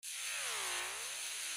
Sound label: noiz